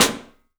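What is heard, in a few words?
PICOLO SNRS, snare, drums; 3D Sound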